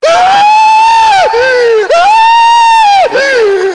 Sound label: crying woman